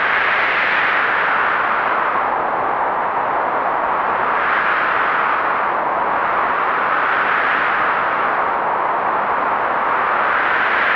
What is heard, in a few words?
Wind; RealisticCarControllerV3; Racing Game